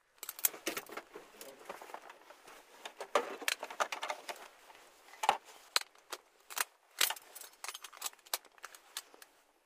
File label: Fastening, unfastening the seat belt in a helicopter